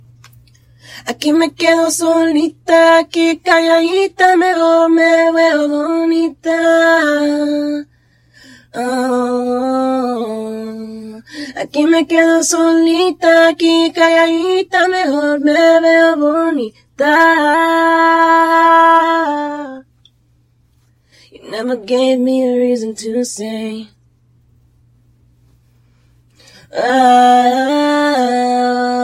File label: acapella, pop 80 Em